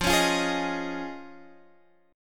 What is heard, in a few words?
F Minor 7th Sharp 5th, F; down; chord shape